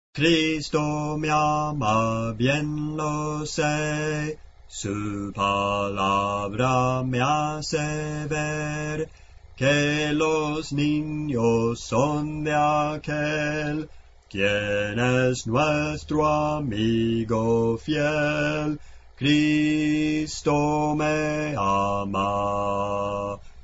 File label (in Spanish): Bajo, Cantos Espirituales; Clase del Canto